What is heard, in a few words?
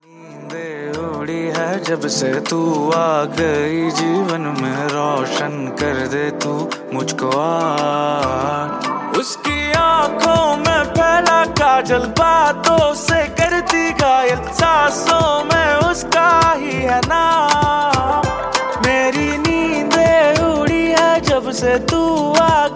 Ringtone